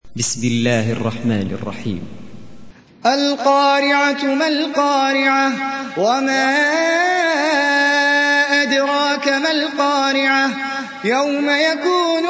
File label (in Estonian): Al Qaria retsitatsioon, koraan